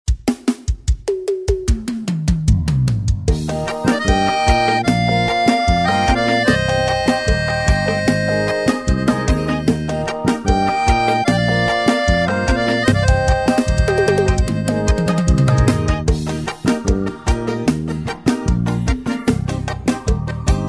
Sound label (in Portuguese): Playback Versao Forro; forro cristao sax alto eb